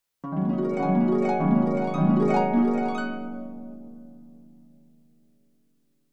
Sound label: dream harp